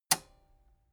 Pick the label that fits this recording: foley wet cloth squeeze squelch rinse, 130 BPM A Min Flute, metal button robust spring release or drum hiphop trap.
metal button robust spring release